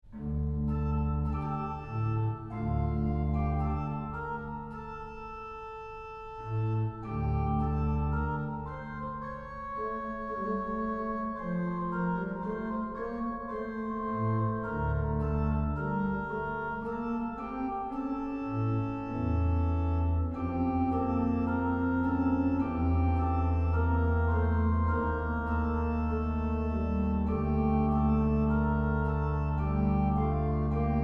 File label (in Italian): canone acclamazione ortodossa, organist; Carlotta Ferrari